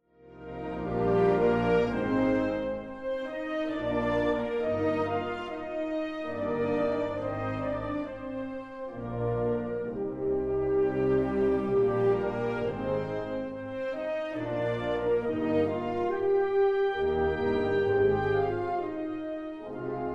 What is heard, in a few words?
Jupiter Hymn